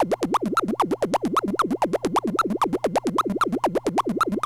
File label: TEK NOISE, SYNTH SEQ